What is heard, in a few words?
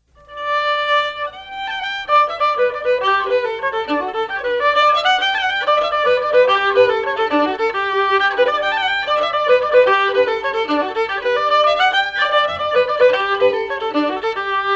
pitched up, Sliabh Luachra slides; kilcummin padraig